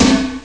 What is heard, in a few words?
hall tom; Amiga Soundtracker Sample Packs